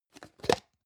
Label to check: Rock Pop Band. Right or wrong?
Wrong